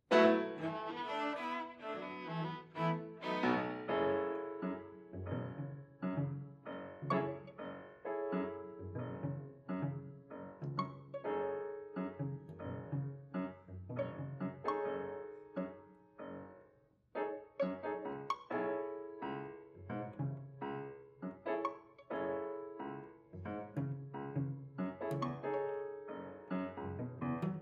Piano Trio Recording

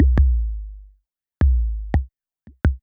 808 Loop BD 85, Roland 808 Drum Loops, 85bpm

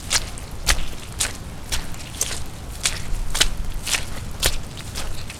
Footfalls In wet Field; footsteps

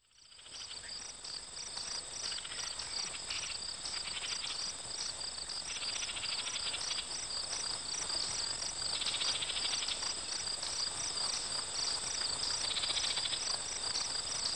Hot Summer Day In The Country, ambience

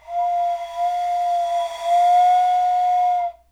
train Whistle; whistles Horns Bells

